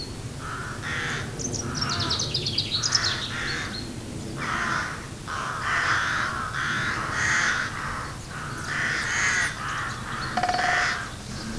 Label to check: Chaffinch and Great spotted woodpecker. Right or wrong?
Right